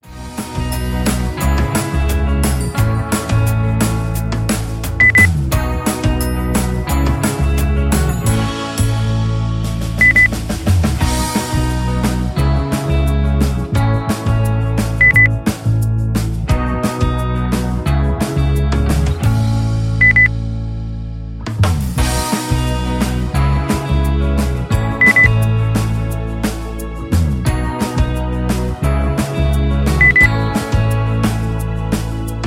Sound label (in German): gute laune